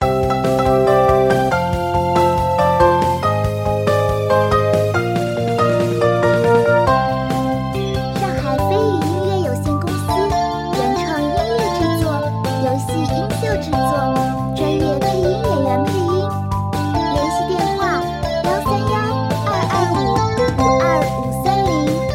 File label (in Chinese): 电子时尚明亮; 挑战赛